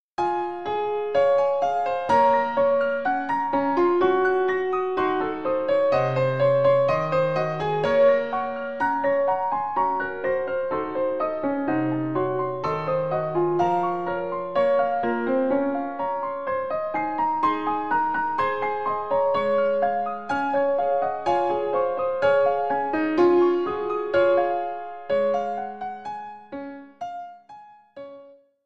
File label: piano